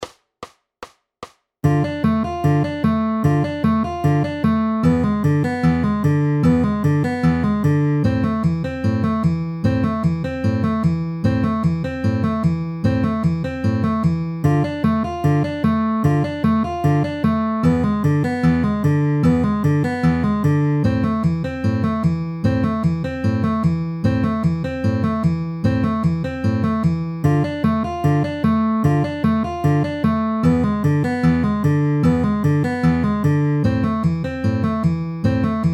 Dm E7 Am Am downward arpeggio at 150 bpm

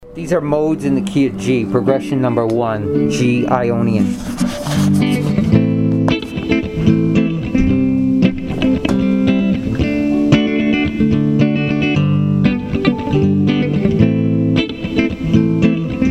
modes in key G G ionian

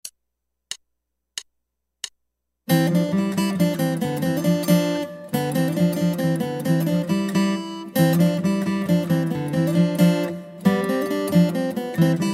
Guitar

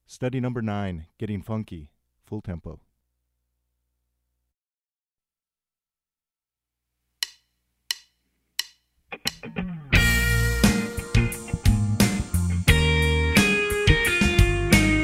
Getting Funky Fast Tempo

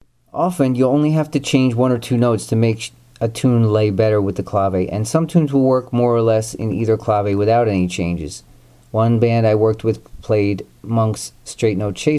latin jazz; Mark Levine

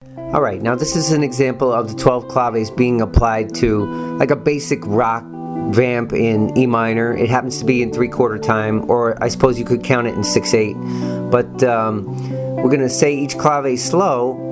claves to rock vamp

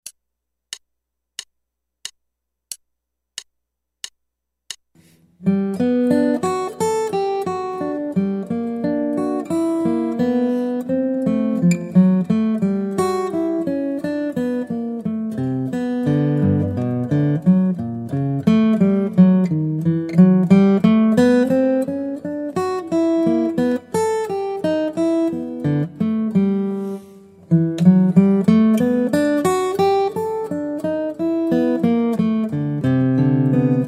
Guitar

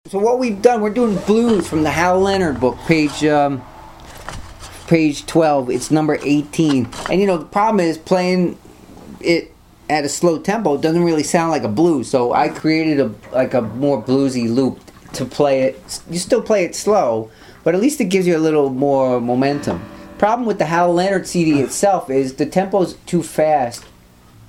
blues play along slow; hal leonard

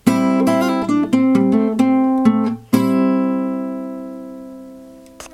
a shape major embellishment lick b, guitar

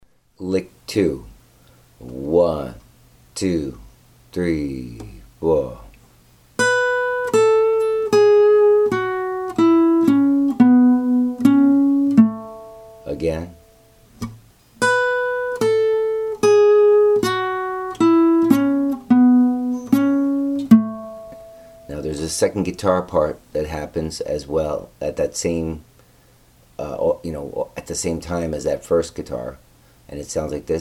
lick, guitar

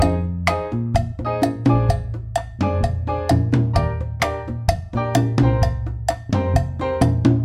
cha cha cha

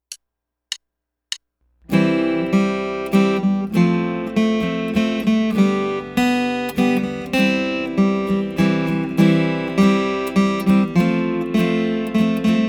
Village Waltz

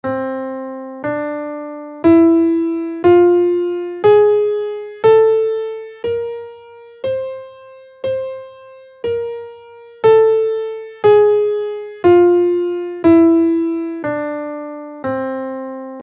mixolyian augmented scale in C

